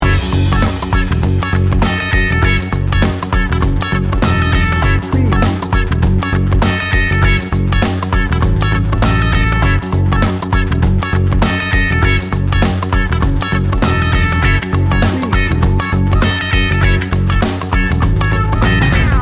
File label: 80s chill loop